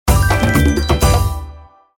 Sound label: lv up